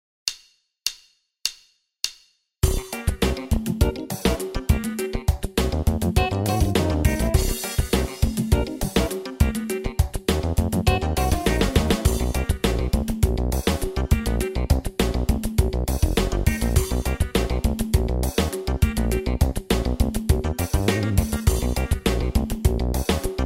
groove extreme

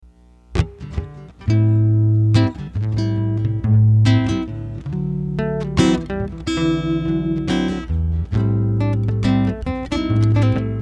jam blues

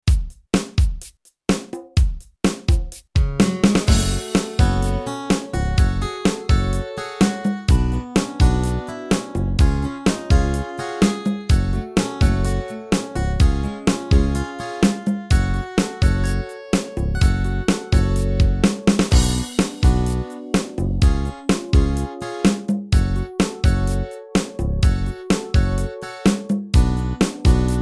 ritmos yamaha pop

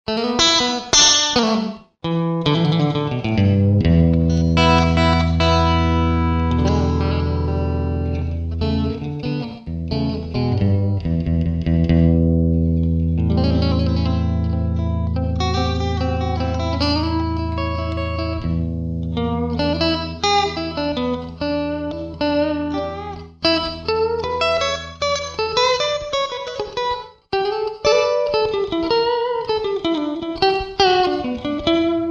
finger style blues

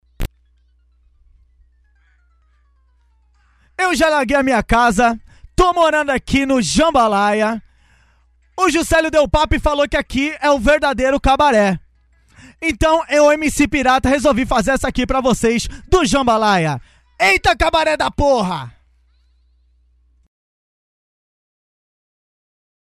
acapella funk

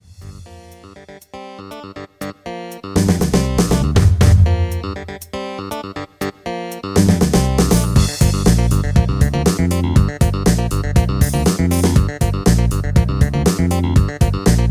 rock solo

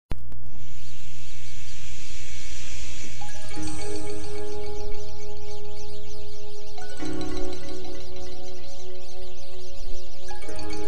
instrumental

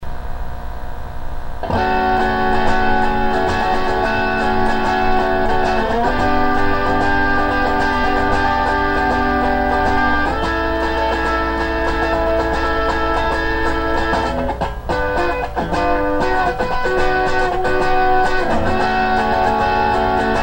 guitar

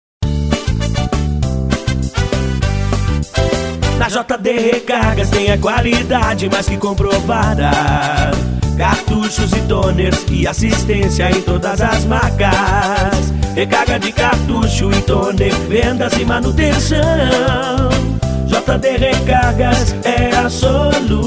JINGLES

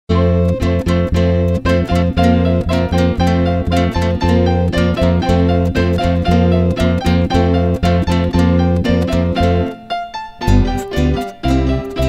piano

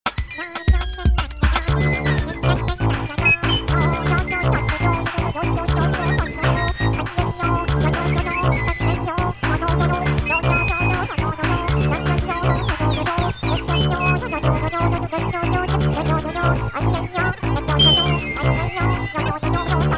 groove

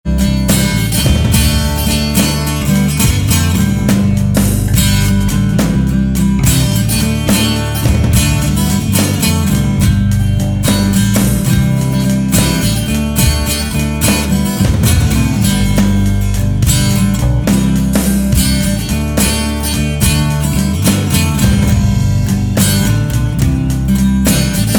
guitarbox

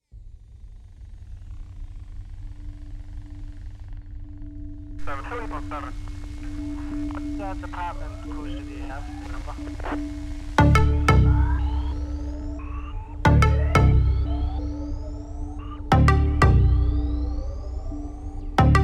frequency experimental

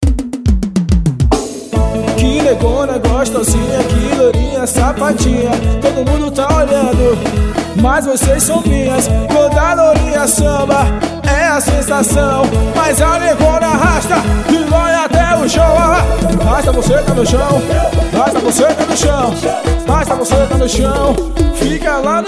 rasta

